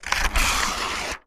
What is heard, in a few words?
paper tear